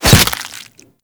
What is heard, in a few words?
Barb Hit Flesh; Warframe; Desert Skate; Hit Effects